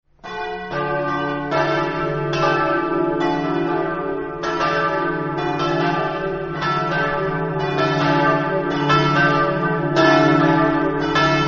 campane, Mereto

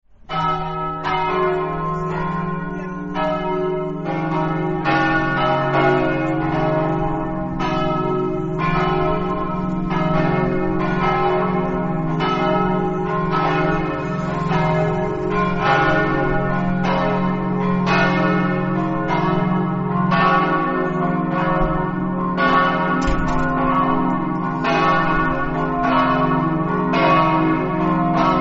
muzzana, campane

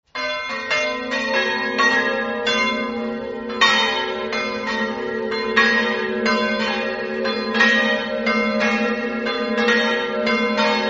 castello, campane

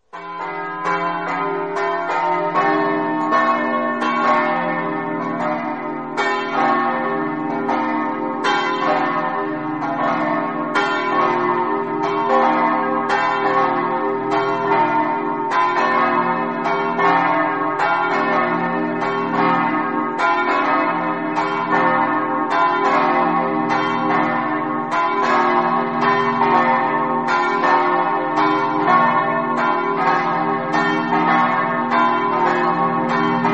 resia, campane

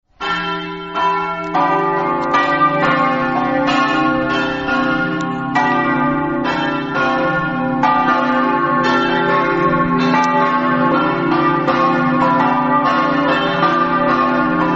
gradisca, campane

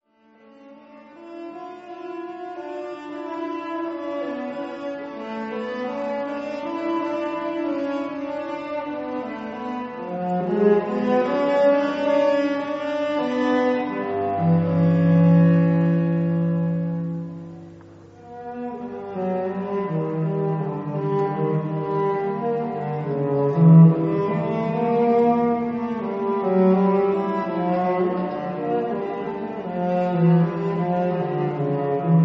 violoncello; messe

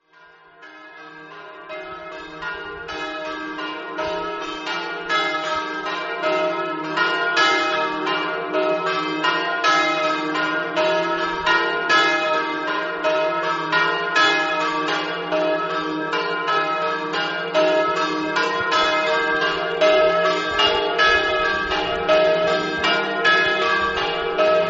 vendoglio, campane

